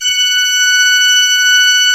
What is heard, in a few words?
F5, Cathedral Organ, MANUAL PLENO; Propeller Island